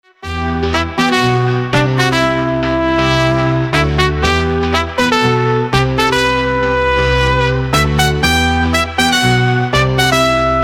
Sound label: Future House / Future Garage, tuba